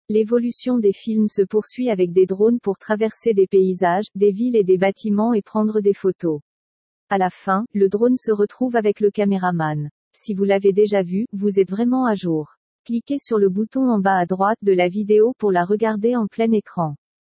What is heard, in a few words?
drones